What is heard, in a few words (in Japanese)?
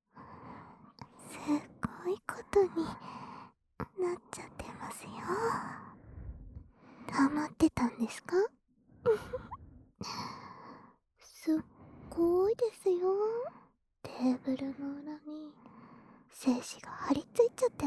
反転 ローション付きおしぼりでお掃除; お耳密着型☆コンカフェ『みみなめぇ～る』へようこそ♪